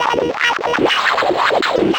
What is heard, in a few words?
Distorted Reality; Spectrasonic; DIGITERROR